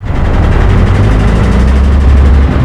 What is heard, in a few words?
tank mammoth start